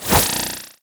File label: Multinade Initial Throw; Trapper